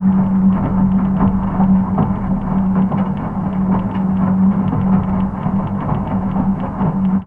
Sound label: Washing Machine, contact mic, mechanical; musicradar; contact mic madness; Raw